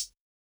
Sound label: Wild Hi Hat; Closed Hats